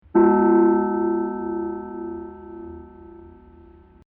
Gong Creepy; Gongs; Musical

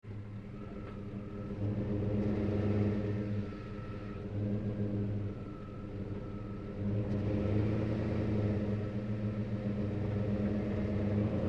Truck GMC Savana Onboard Drive Corners Fast Idle; 2010 GMC Savana; Big Room Sound

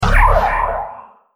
Heavy Laser Blaster Gun Scifi; Laser Gun Sound Effects; Royalty Free Laser Sound Effect - An Orignal Sci-Fi Laser Sound Effect; Andrew Fleming

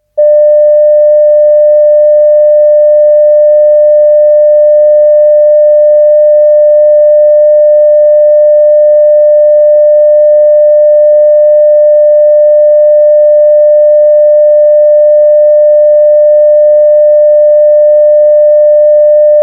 Sine Wave To, Sine Wave Tone, 60 Hz; Sine Wave Tone, 600 Hz; Sound Design; BBC

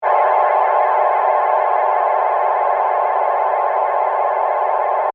Ray Beam Burst, Scifi; Science, Fiction; Battle Sound Effects; BlastWave

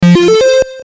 Musical Arcade, Musical Arcade Machine Ascend; Miscellaneous Musical; Blastwave

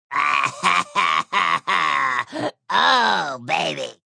Laugh Oh Baby, Male laugh, throaty; Laughter Sound Effects; Sound Effects:Voice; VoiceBox

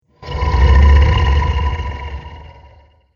Dragon Getting Angry, monster; horror

